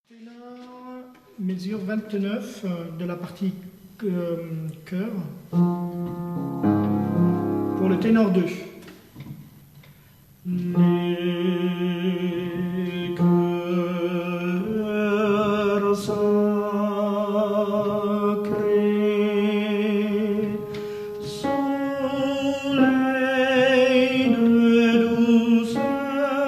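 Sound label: Tenor, chants; SATB; Ténor 2; Un monde nouveau; Antonin Dvorak; concert du 15 août 2009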